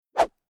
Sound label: Whoosh Swish Sweep Long Bamboo Cane High Pitch Fast; Staff And Rod; Tom Hutchings